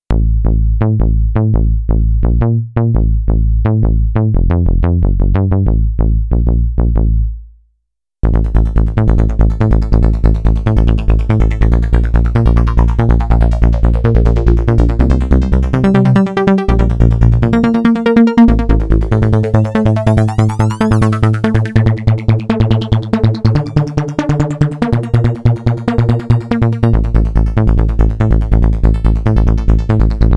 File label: Tek Seq Bass